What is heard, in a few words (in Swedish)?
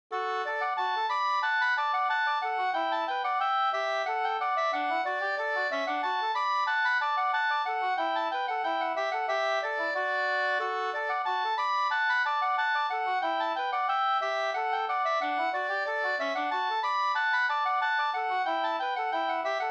polka, polkka, 2st; Salonkylän polkka, polka från Finland